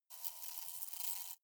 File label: hornet hum glass